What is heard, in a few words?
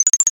bat